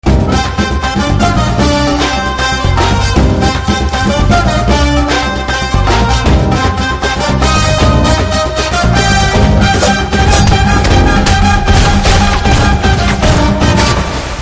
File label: Trailer BGM Ringtone